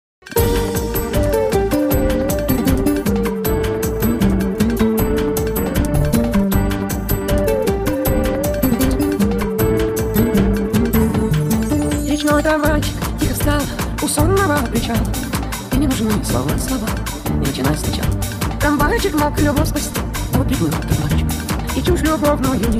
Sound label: techno remix